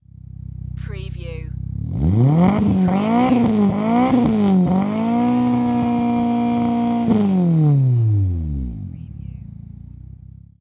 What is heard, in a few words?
MITSUBISHI ECLIPSE EXHAUST MULTIPLE REVS